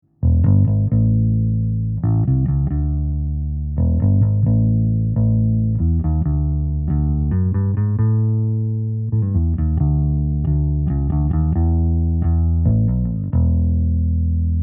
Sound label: Rupert Neve Designs 511 BassAmp EV PL20 Silk Full